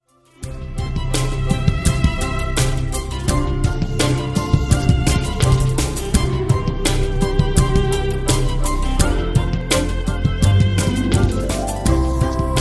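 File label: classical melody Sony, good classics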